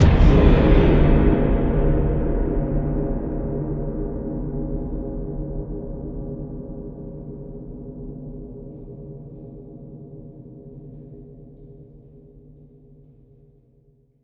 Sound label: Cinematic Metal Impacts; STING; take it on the chin; boom library